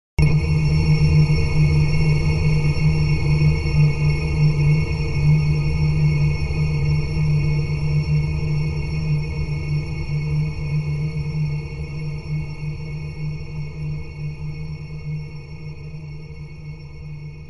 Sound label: science fiction hit designed drop drip massive reverb atmos